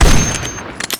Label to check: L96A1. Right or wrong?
Right